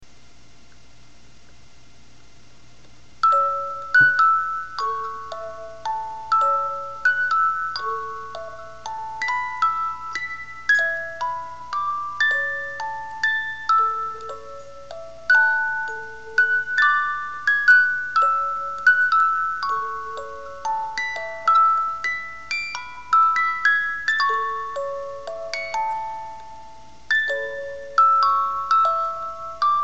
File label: Music Box Silent night